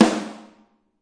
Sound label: real snare; REAL LIVE KITS